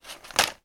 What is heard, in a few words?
impact paper bag drop; sound effects one